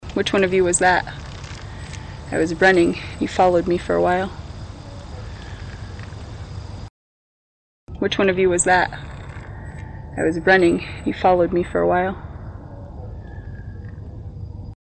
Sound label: bells; Bells 4th; This was said to sound like a piano; Trails End Historic Site; Kendrick Mansion; Wyoming